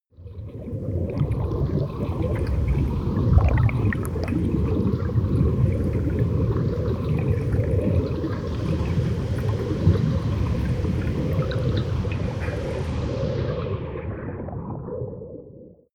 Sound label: water fx